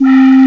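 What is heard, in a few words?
calliope lead; gmplay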